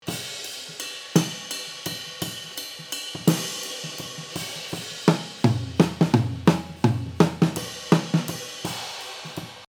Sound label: Control Overheads